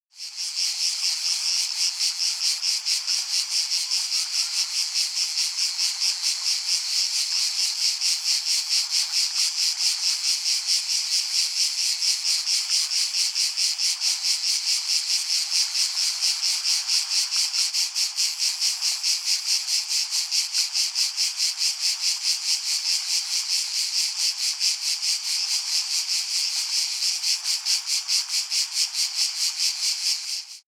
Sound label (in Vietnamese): Tieng ve sau vao ban dem